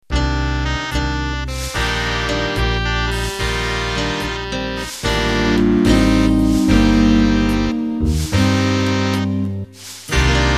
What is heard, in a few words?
Slow Jazz End